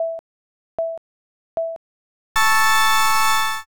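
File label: countdown new year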